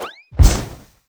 gnome desintegrate comic

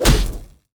pandas melee; wukong tower

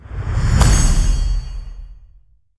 clapping; boss efreeti

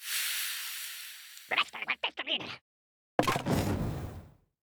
camper Fire tap; sfx

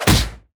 minotaur headbutt

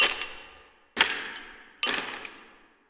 mining; hulking rage

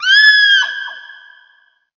volcano virgin Scream

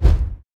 fireball shot; arivan

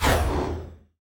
spiders hero range

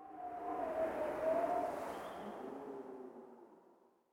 terrain Ambient wind

